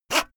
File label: saw use short fast